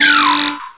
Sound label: cry